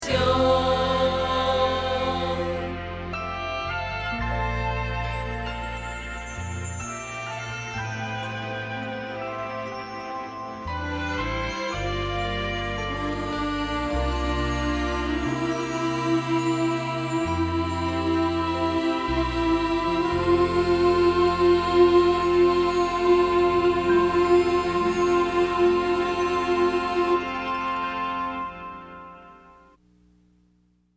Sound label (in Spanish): Contralto